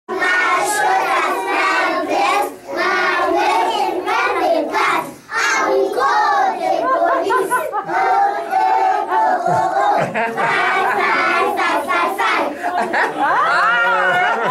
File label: African Children from Khayelitsha